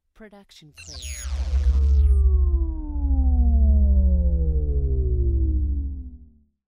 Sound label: Sci Fi Power Down; SoundsCrate